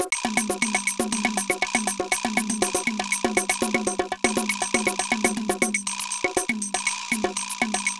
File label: Rhythm